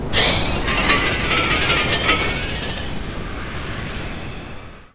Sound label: crunch zones 3D